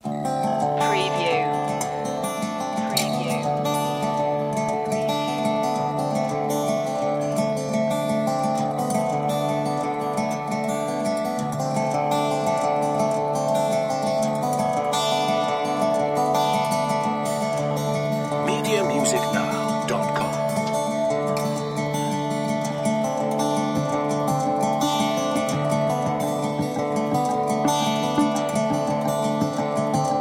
no lead no drums

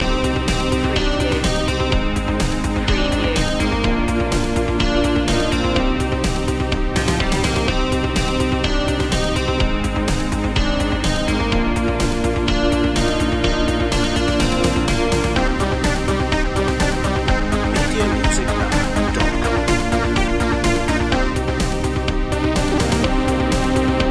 retro video game

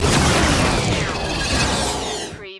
Stinger; Sound Effects Bible

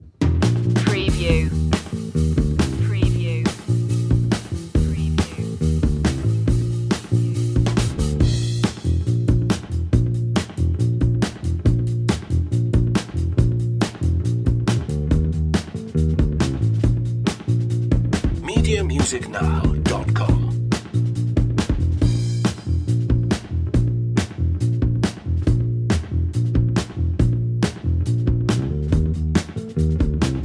love up bass drums

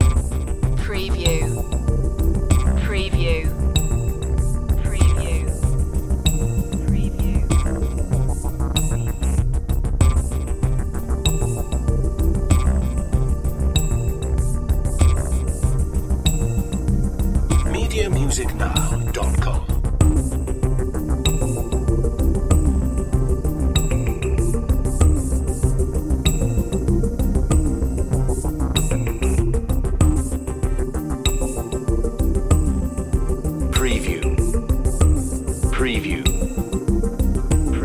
robotic action loop

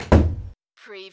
Servo Panel Sound - Release

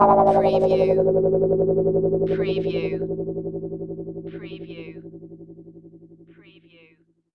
Retro Scifi Hit

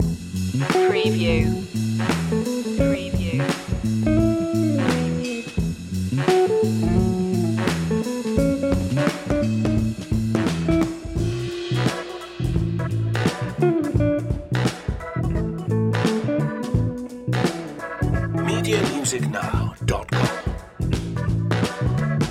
cashmere deluxe loop

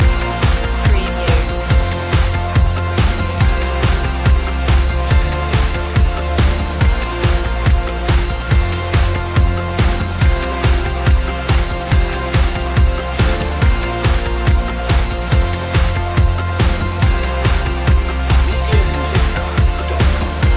bullet trains loop

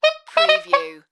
Sax Beeps

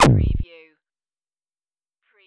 zap; Button / Multimedia Sounds

